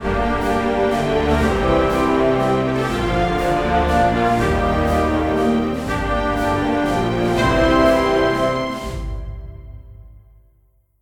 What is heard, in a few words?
ball out; DW How to Train Your Dragon